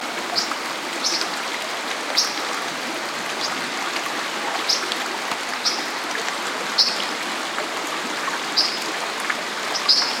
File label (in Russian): penie buroj oljapki i shum vody